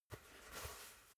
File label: basketball spin turn in hands short quick; sport freizeit